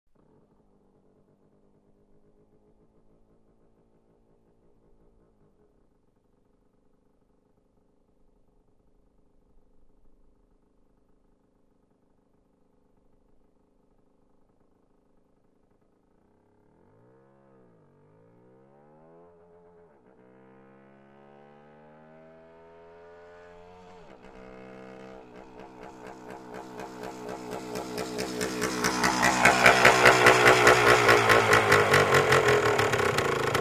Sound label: Motorcycle approaching, turning off